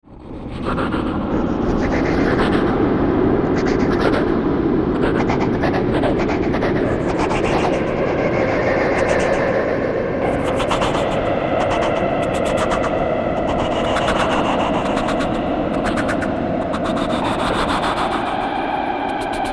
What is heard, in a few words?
creepy echo scary and spooky sound; پکیج کامل افکت صدای ژانر ترسناک